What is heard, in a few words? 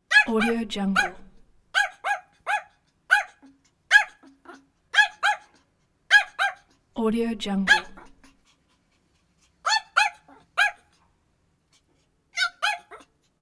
chihuahua barks and groans; پارس سگ کوچولو